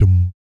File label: BS DMM, Vocal Planet Jazz & FX; ILIO; BASS DUMMS